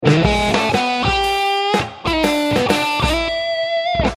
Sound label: Blues TBSCR JCM900